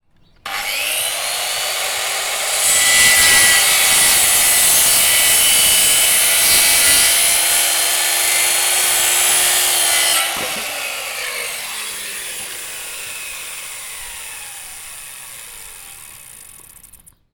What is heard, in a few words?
Skilsaw